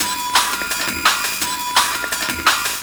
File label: Classic Beat, Drum N Bass; Sonic Foundry (Sony Creative Software); Knutrix Drum & Bass